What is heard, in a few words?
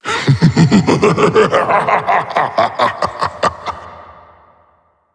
laugh; slender; meph